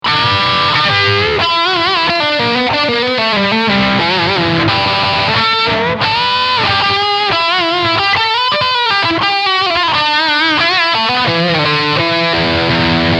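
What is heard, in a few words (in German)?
Lick Distortion Steg SC, guitar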